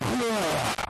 distorted scream medium jumpscare layer creepy horror